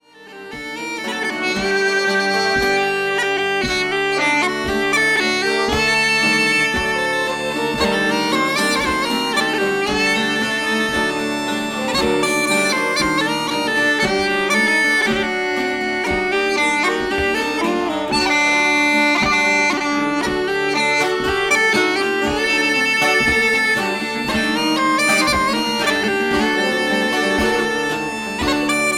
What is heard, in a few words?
Jewish Tune